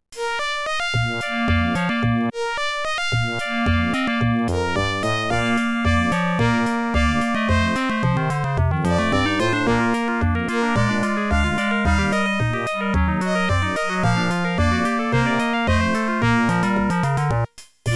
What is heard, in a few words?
ADLIB OPL2